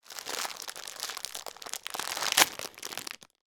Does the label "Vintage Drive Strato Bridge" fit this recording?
No